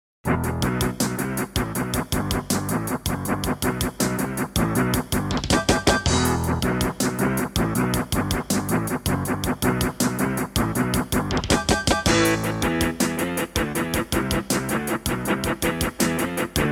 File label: awe32; doom e3m1